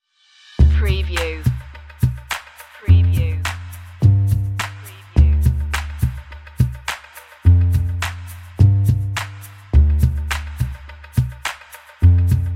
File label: drums and bass; road